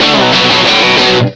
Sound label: rock guitar; Philips; Multimedia Studio